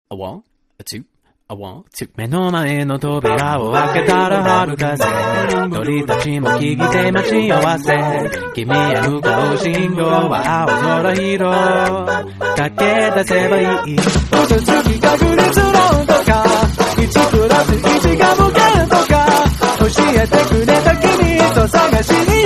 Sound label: swing arrangement with some drums